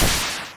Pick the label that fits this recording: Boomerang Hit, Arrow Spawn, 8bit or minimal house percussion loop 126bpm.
Boomerang Hit